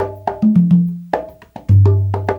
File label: CONGA 105